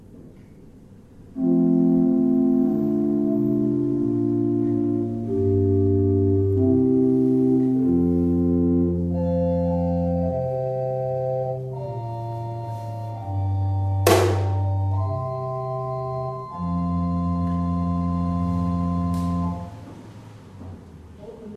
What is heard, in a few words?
Osmond; Klangprobe Stopped Diapason 8' - M1